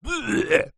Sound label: human male funny vomit fake sound